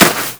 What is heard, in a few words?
foot grass; Call of Duty Modern Warfare